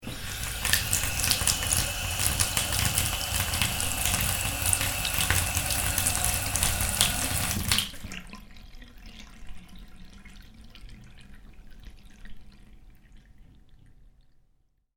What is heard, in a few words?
Tap bath, Tap: Water Runs Into Bath Then Drains Away; Bathroom; Household